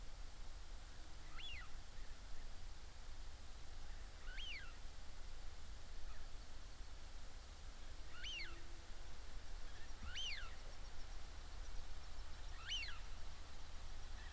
Eurasian wigeon; پرنده گیلار اوراسیایی

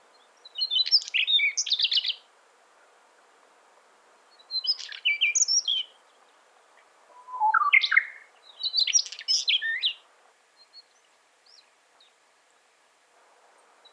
Daurian redstart

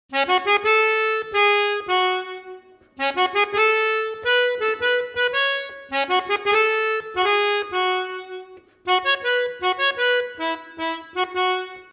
Melodica; ساز ملودیکا

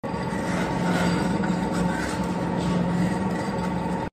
stone mill; آسیاب سنگی

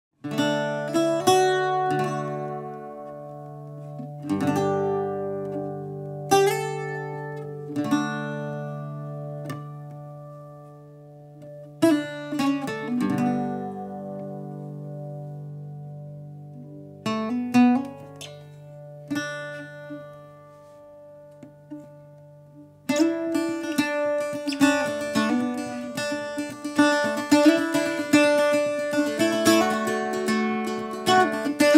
Mandolin

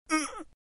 straining

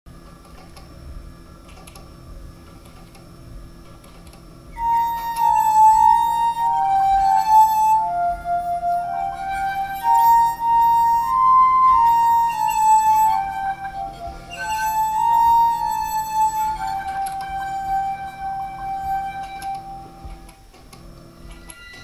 glass harmonica

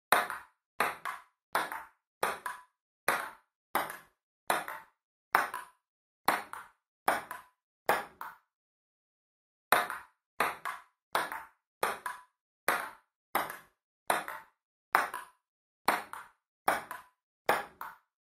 Ping pong

